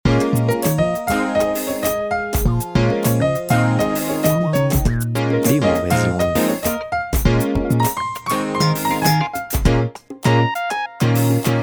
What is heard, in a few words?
Funky